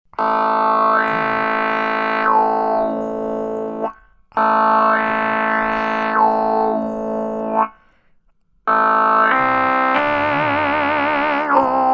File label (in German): Dunlop MXR M222 Talkbox Vokale